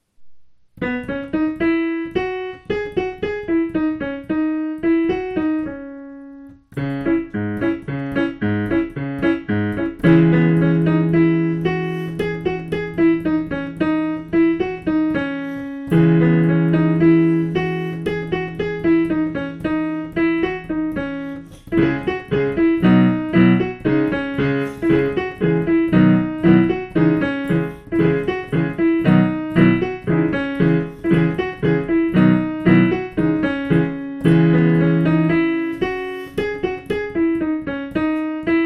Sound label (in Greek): kalanta protoxronias ikarias piano